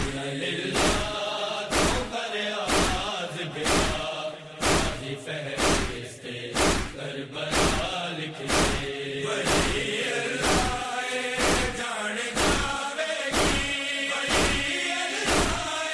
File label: noha tone